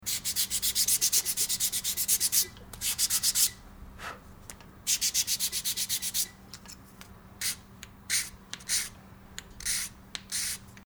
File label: craftsmanship